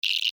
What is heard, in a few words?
Insect Voice Chirp, Insect, Voice, Chirp, Vocalization, Bug; Animals:Insects; Blastwave